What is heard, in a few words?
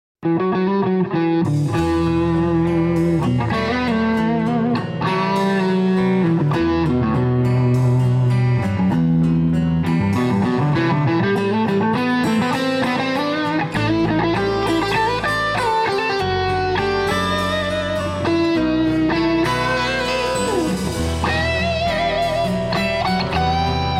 Gibson LP Burst PAFs; 1960